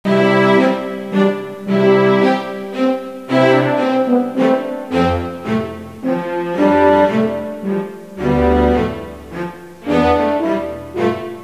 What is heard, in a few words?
clasical